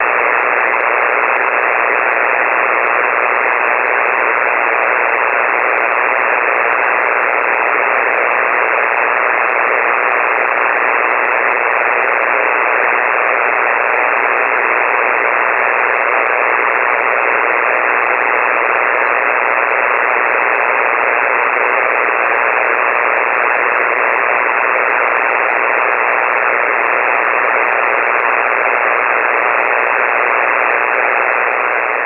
power line, Power Line Noise, in SSB mode. Multiple sources, noise is S9+ at all times.; 2012 Spring/Summer Power Line Noise; One of my more quiet directions!; 6 Meter; N1BUG